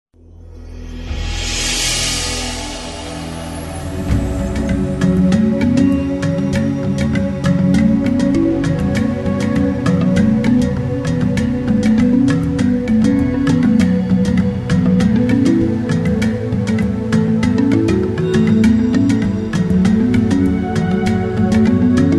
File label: rain mono